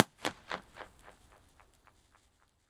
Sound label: Echo Bridge, Impulse Response; EchoThief Impulse Response Library